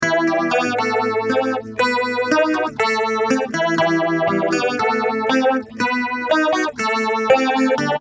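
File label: acoustic guitar deluxe phaser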